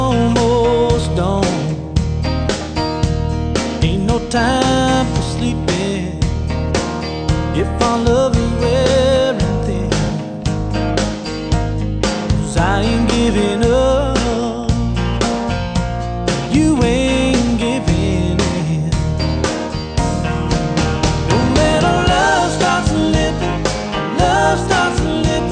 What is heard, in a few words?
country